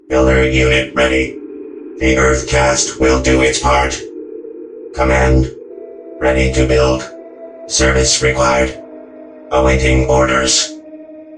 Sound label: Drone; Warhammer Tau